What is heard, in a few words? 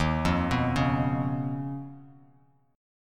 Eb 7th Suspended 2nd Sharp 5th down Bass Standard, chord; Eb7sus2#5 arpeggiated; JGuitar